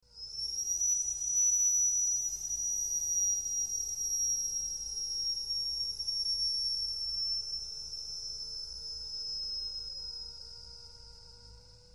machines ventilator compressor

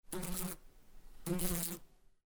Fly

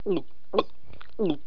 people

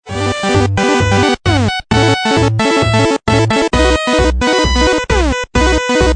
8 bit

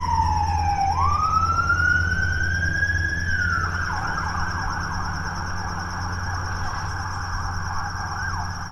cop car and helicopter

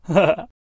male laugh

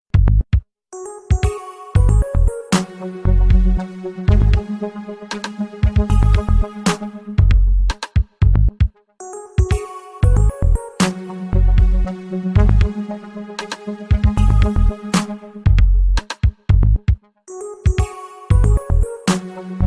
xperia ringtones

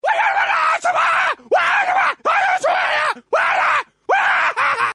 ringtones crazy ninja karate

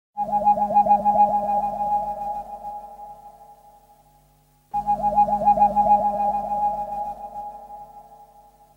submarine radar

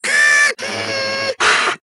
robot arm moving

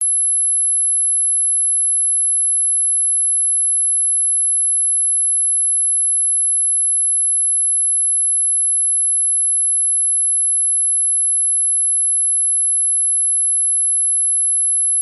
whistle dog

